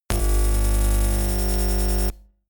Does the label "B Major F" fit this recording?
No